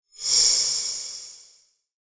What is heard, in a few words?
war ui menu futuristic menu transition empty space